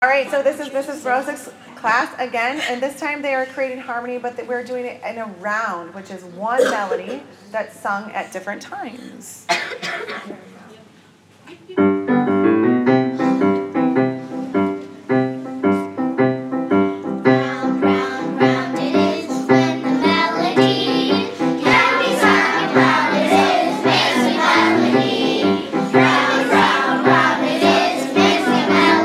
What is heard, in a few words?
round